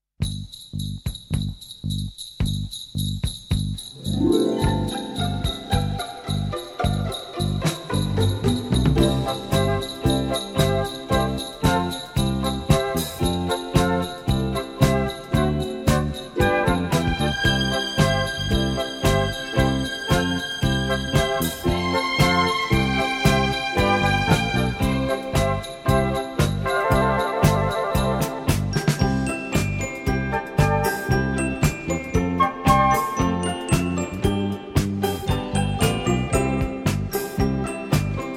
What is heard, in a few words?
Jingle Bells